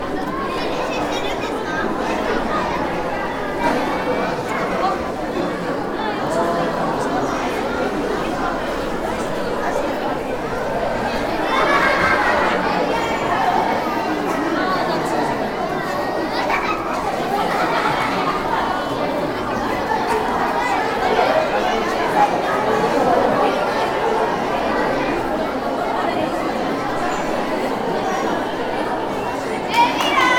festival in a hall

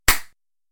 cracker

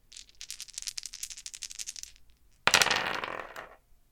dice

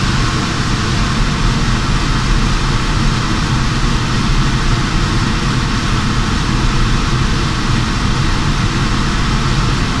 eatery exh fan

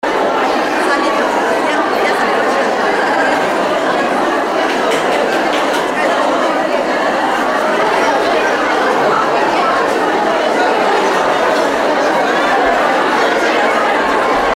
concert hubbub

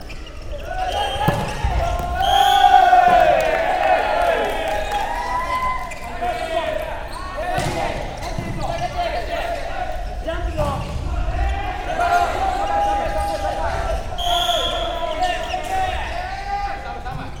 valleyball boys; sports

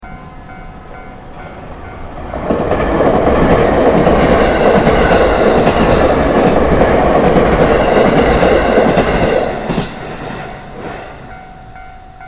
trains passing

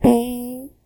fart; human

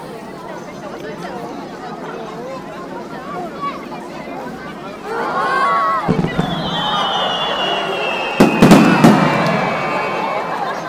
fireworks festival

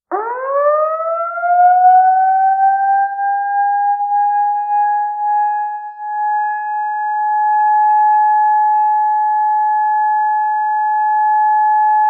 siren

